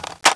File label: xm2010 clipin